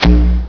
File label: launch, weapons; XDM